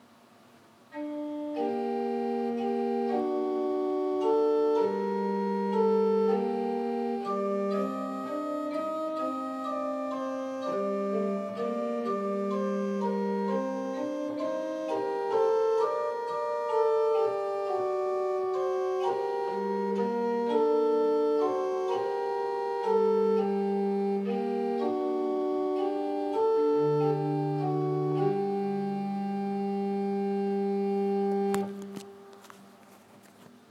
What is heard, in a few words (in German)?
viola du gamba, orgel